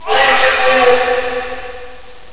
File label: ghost; geist